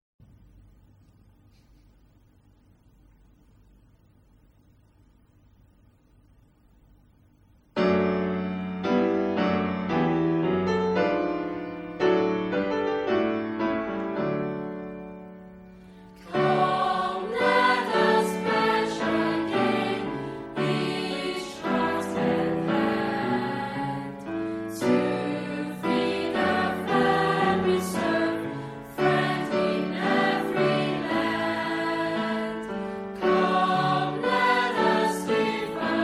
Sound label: red cross youth song english